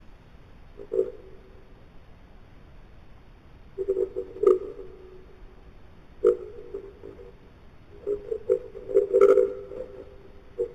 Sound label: Signal